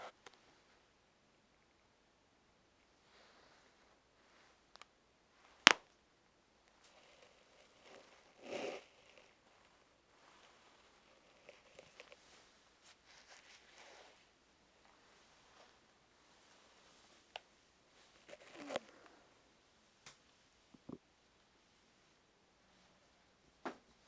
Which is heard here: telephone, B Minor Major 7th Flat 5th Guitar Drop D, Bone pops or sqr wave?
Bone pops